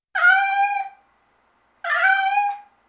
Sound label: cat meow